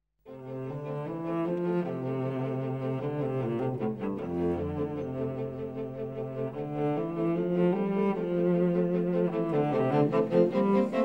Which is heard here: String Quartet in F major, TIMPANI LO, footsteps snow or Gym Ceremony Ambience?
String Quartet in F major